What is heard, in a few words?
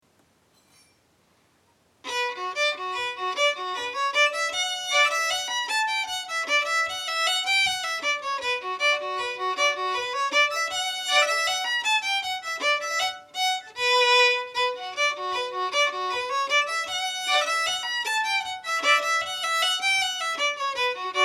Drums